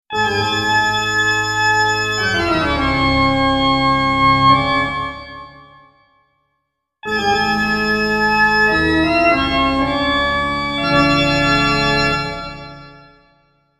Toccata And Fugue

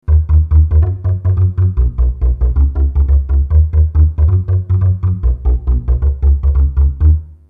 New Beat Bass

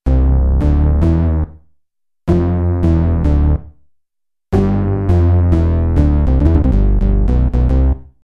Bass; d-50

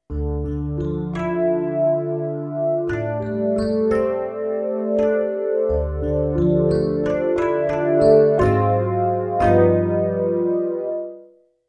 clean Fender

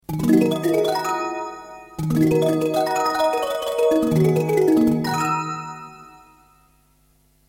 Tinker Bell

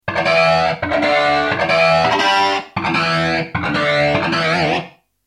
Rock Roll

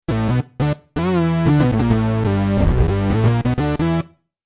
Moog Bass; d-50

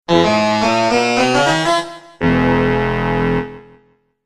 The Two Saxes

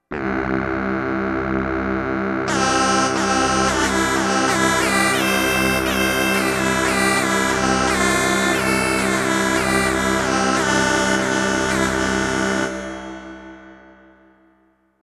Scottish Kilt

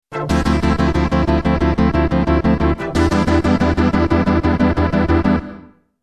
Clav

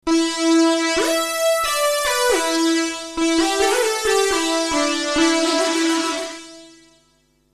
Ideal Saw

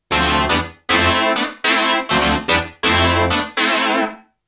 CLAV; dx7

